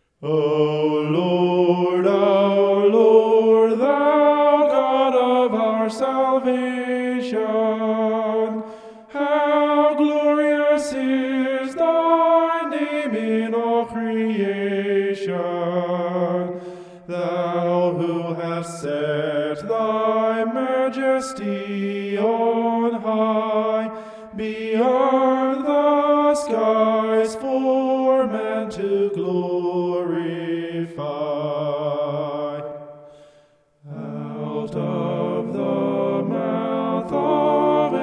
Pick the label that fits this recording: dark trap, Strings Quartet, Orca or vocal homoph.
vocal homoph